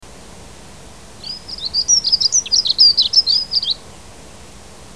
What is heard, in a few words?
The sounds of birds